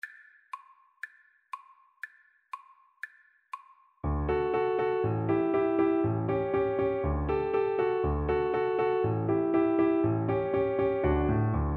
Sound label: ecossaise, viola